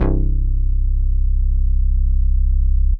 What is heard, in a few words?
Synth Prophet Bass C; Electronisounds